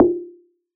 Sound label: FX JUG BND; ModelCycles